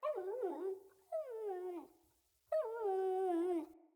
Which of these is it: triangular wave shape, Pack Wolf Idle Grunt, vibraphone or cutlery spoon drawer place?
Pack Wolf Idle Grunt